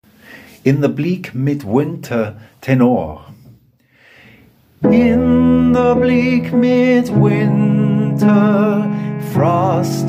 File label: In the bleak midwinter Tenor